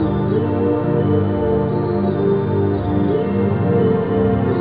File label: didada, synth